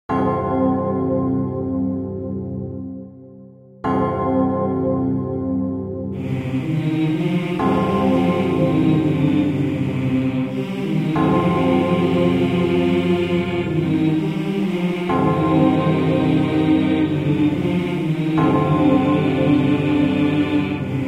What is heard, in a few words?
brass minus